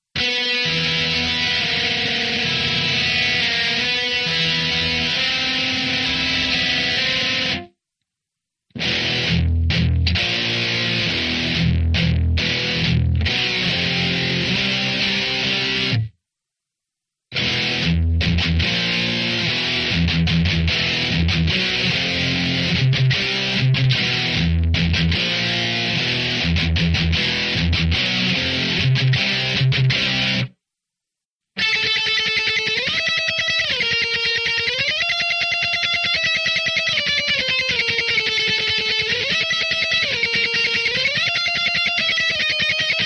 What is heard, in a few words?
Rock guitar; Total Rock gt